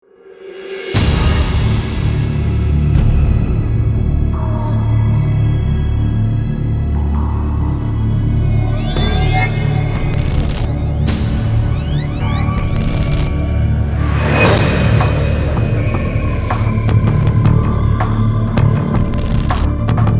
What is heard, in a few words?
drums